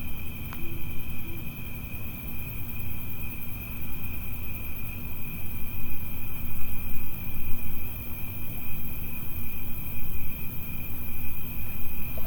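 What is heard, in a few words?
cricket ambience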